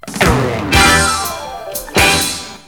FUNK; L'Effet Pommier - Volume 04; MUSIC

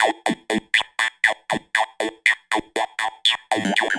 Keyboard; Sonic Foundry

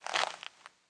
lion graze; Immortal

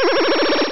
bonus; yaac

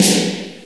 snare; Descent

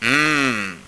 mmm; Exile III v1.0

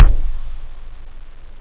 kick; OctaMED SoundStudio V1; jazzies samples; perc

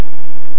shhh, hockey; Know Your Hockey

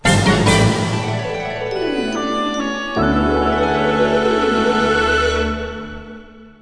JINGLE; Let's Play with Sakura!

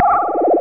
spring it; nemeses; Amiga 8-bit Sampled Voice; 5,052 sample rate

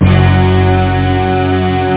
bass strings

